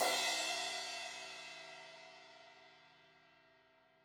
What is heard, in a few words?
Crash 16in edge, Cymbals; Deeply Sampled Drums